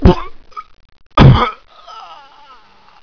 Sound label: die; wartso; barney